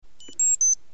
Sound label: IAQ buzzer alarm paused